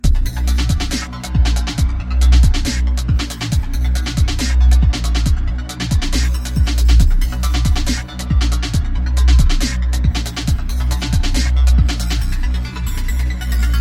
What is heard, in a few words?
DUBSTEP